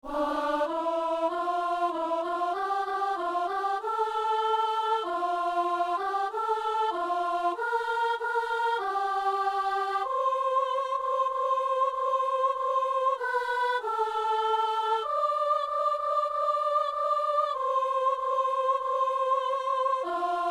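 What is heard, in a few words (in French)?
messe anamnèse ténor; messe de saint jean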